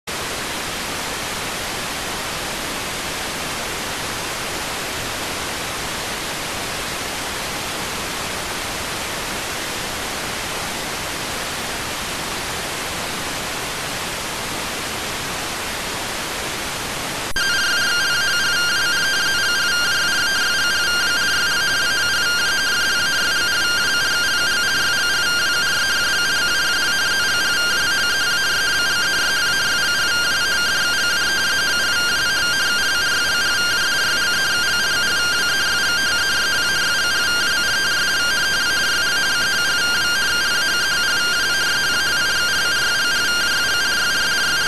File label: rtty rx